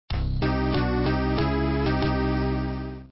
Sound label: pond game over; apidya